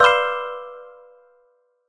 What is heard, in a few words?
Music box clash; Phasmophobia